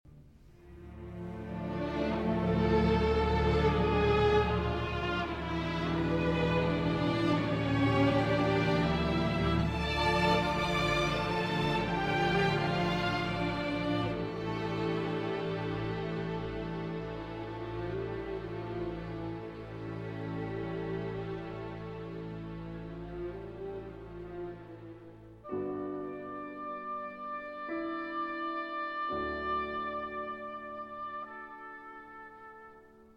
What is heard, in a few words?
Piano Concerto in One Movement, Adagio cantabile; Price